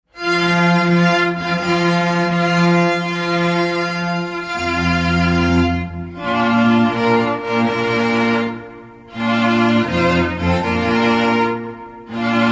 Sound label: Bridal Chorus, string quartet; Wagner